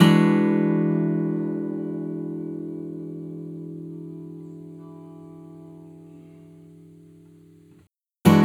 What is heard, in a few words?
E, Guitars; Total Drum&Bass; 90 s Sample CDs